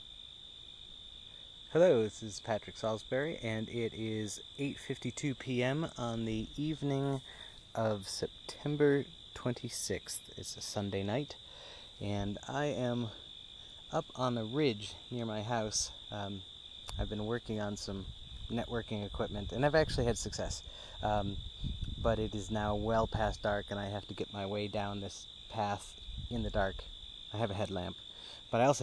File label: crickets on the ridge near my house